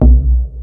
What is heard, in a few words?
FX Sounds, Drum Machines